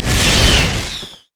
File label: carpet bomb; rumble skin03